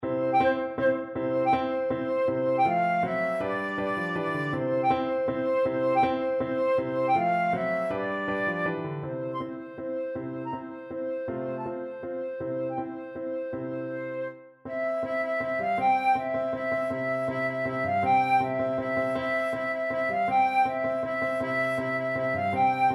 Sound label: vivaldi autumn, flute